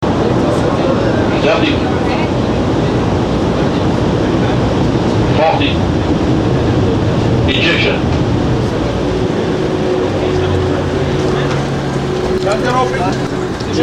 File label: injection